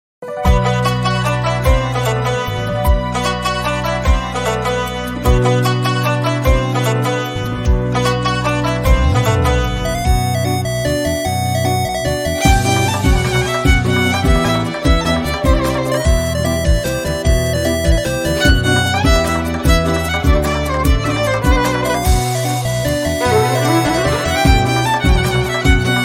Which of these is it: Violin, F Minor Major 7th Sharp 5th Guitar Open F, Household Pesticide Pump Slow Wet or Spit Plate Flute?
Violin